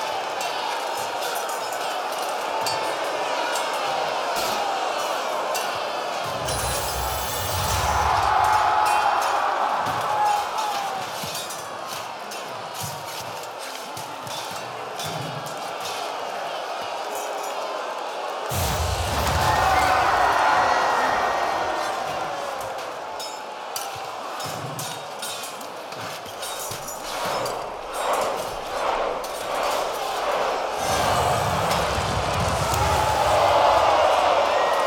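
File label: Menu ambience loop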